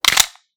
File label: gun magazine insert empty